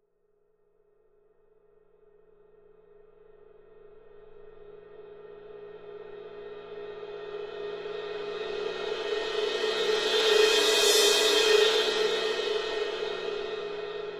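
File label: Cymbal Large Crescendo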